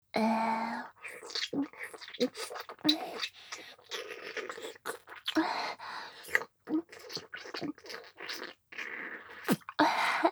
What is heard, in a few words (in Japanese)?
舐めフェラ（激しめ）